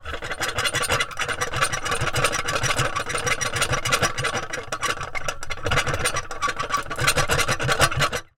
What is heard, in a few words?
foley metal lose light rattle squeak handle